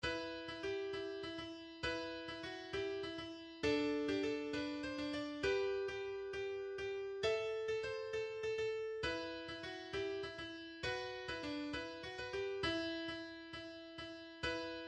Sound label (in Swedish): Slängpolska; Ninas; 3 mot 4